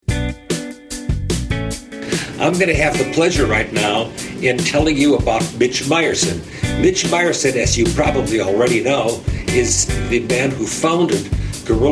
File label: music